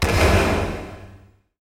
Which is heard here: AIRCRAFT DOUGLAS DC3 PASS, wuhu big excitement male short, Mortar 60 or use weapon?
use weapon